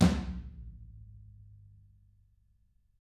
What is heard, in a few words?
TM HIT, Drums